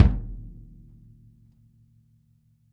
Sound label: kick